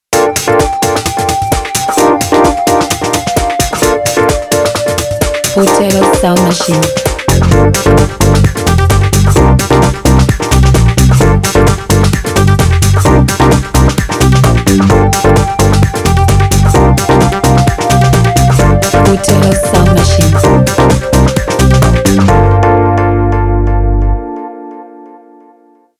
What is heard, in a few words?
ready for dancefloor